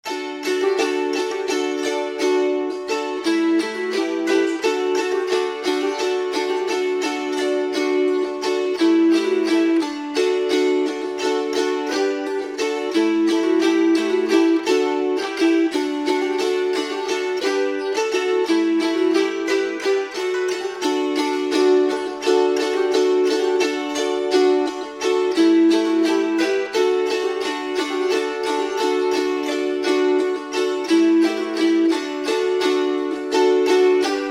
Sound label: Polka